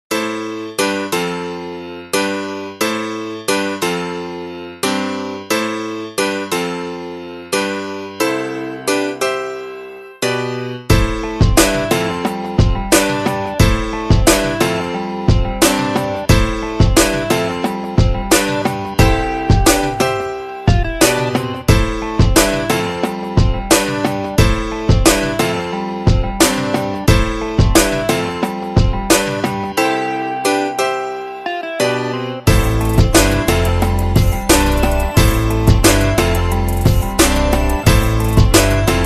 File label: chill beats 70; Freedom Laid Back Beats